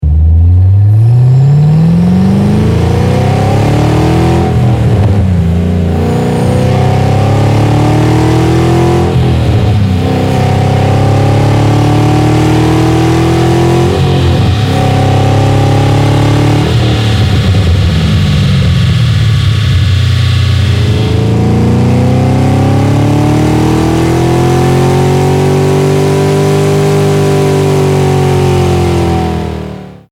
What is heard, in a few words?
Camaro Z28 Engine Exhaust Note